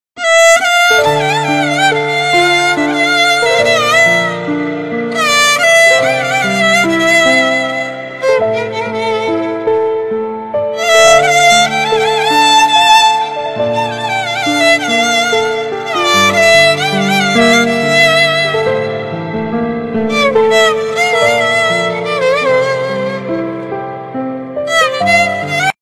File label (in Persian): ghamgin; زنگخور